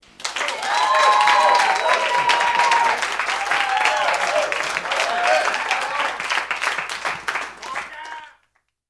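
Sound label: Applause Club Bar Rooting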